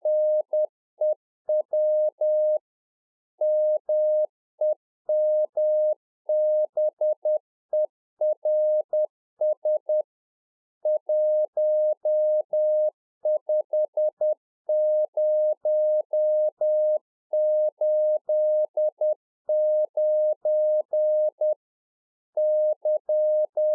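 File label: Morse code, 10 WPM; NEW MEMBERS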